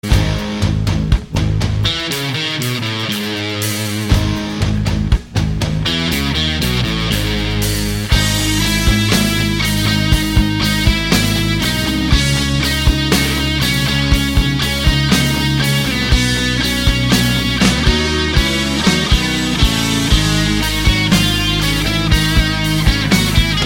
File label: Arabic Rock